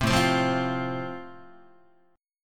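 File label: A Minor Sharp 5th, Guitar, chord strum; Am#5 strummed; JGuitar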